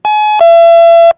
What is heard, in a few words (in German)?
DVT30 innenstation